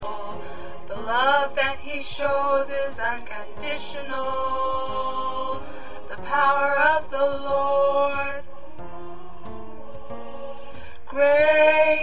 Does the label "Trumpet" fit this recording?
No